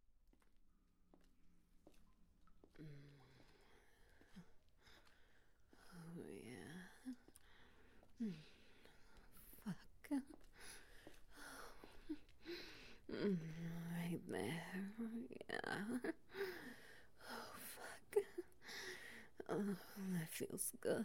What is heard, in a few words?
Ambience SFX Wet Noises